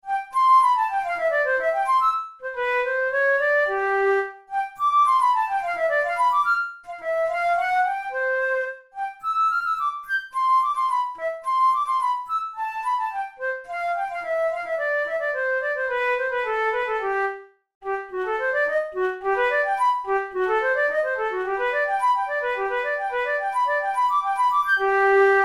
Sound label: melodic exercises, flute tunes